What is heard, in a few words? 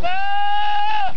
Goats Making Stupid Noises